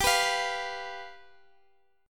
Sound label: G 7th G Guitar Open F; strummed; x,x,x,14,14,12